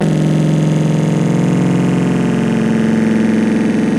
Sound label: slider slide; BlooXoo